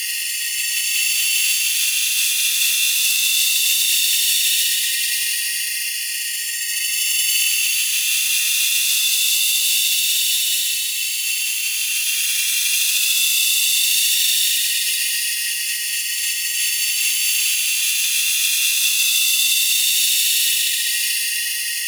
battery loop; Cowgirl

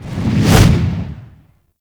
Blind Cast Charge Up; Warframe; Gadgets

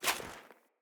Foley Action Armour Walk; Player; Deora Armour

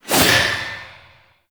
Void Heavy Scythe Alt Whoosh; Warframe; Duviri; Incarnon Adapters; Melee

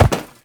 Uriel Step Perforated Metal; Warframe; Movement

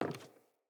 Sandals Walk Wood; Player; Footsteps

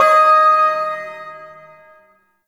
Shawzin Oct Two D Sharp; Music; Warframe; Duviri; Ersatz Shawzin

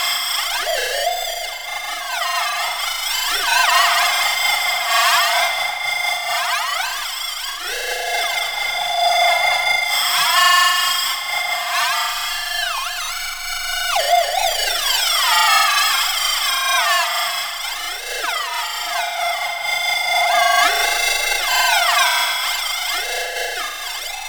Scan Loop; Ordis

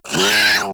Kavat Vocal Pain; Warframe; Khora

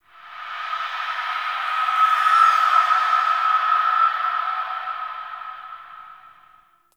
Memory Echoes; Ambience; Warframe; TheNewWar; Zariman

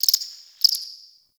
Cricket; Warframe; The New War; Ambience; Zariman

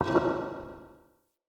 Stone Movement; Ambience; Crypt; Champion Crypt

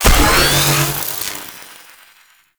Sniper Charge Up, Weapons; Warframe; Sentient; Caliban Prime Sniper

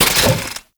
Roller Deploy; Warframe; Kela De Thaym

